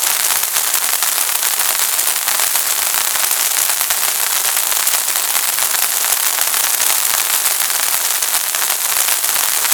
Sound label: ambience disaster fizz